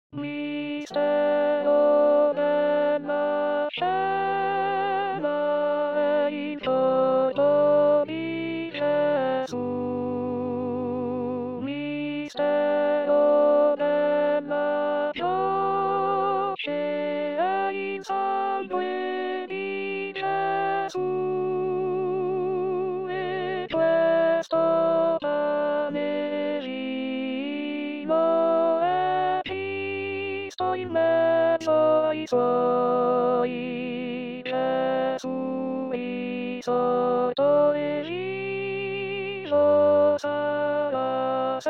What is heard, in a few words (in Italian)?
Contralti